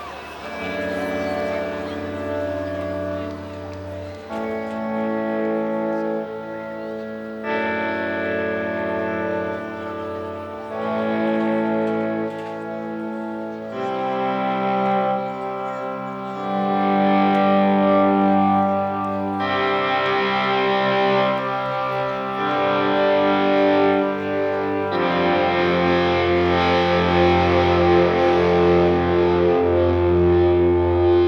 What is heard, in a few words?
Guitar Solo